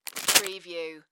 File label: Pasta Bag Set Down; sound-effects-bible